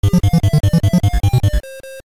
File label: Finished Training; Paperboy; NES